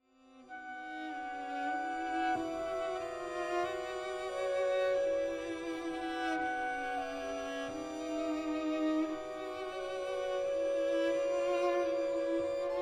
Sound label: Clarinet Quintet